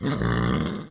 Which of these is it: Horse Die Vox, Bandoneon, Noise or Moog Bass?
Horse Die Vox